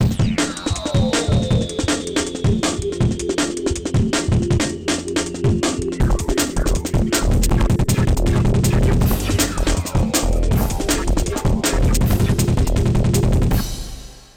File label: Stereo, Fight, Stinger; SpongeBob SquarePants Battle for Bikini Bottom windows